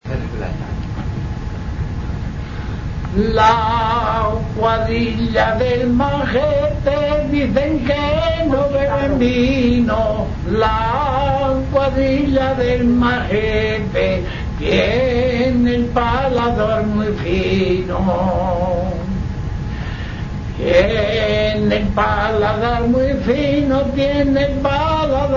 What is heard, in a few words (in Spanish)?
Canto Pandilla, Musica; El Hocino De Blesa